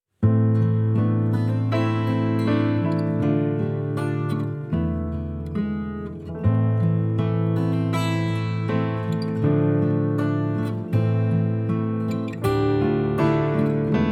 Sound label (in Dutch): Lam van God instrumentaal; kyriale